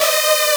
custom synth

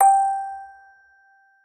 musicbox

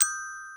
gloken

